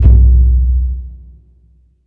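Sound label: Burundi drum, Heart of Africa; Spectrasonics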